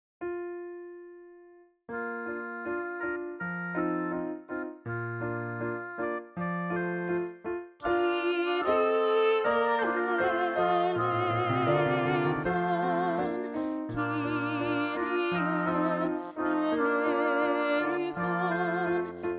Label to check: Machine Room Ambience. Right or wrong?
Wrong